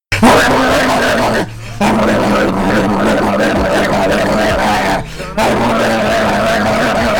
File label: cringe sound